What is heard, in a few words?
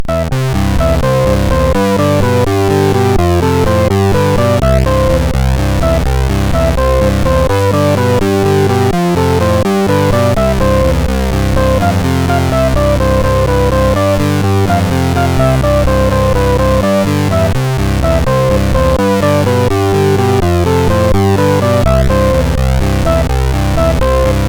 Monkey Music; sid MOS6581R4